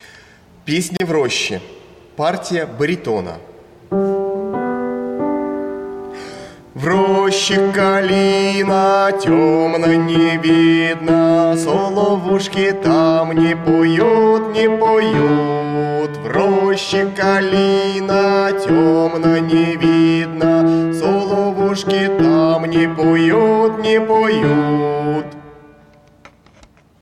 baritone

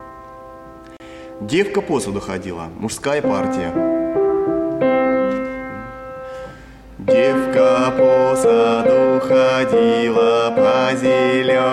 Devka po sadu hodila muzhskoy

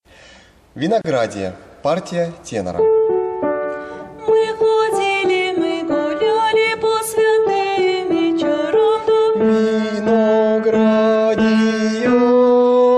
tenor; Vinogradie

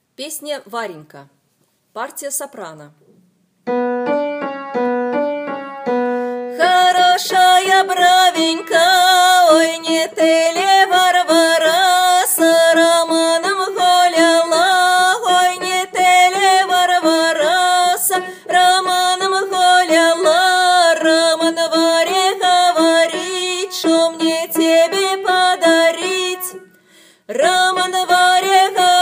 Soprano; Varenka

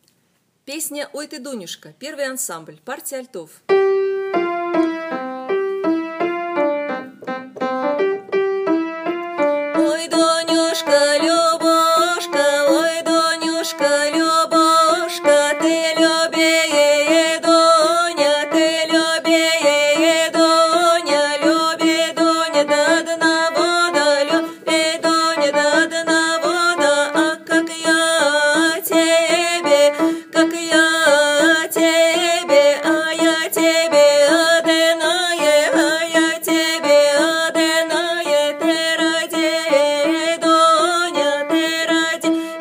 Alto; Oy Ty Duniushka

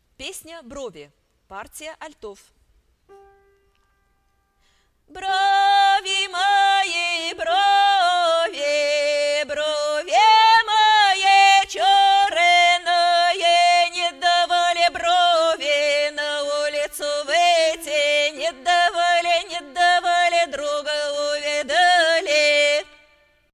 brovi alt, ruschoir

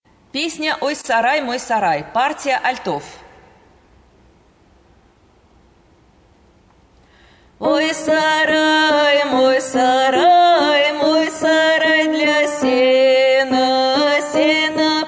alto; Oy saray moy saray

